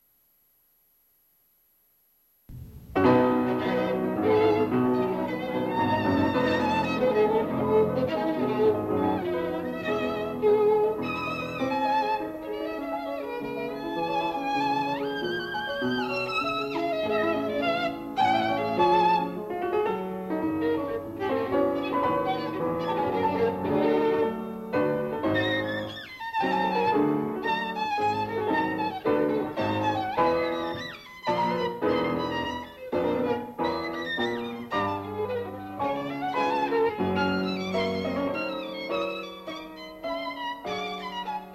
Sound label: Violin Sonata